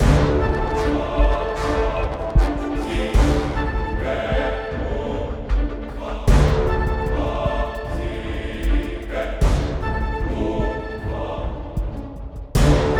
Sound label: Battle Boss; Eversoul; Android